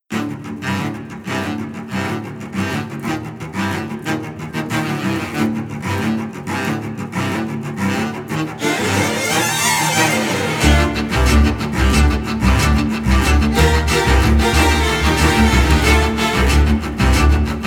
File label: string quartet